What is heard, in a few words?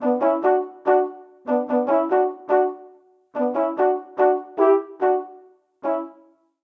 Phrase B Minor 72 bpm, Brass Quartet; Regal Reception; Ableton; Brass Quartet by Spitfire Audio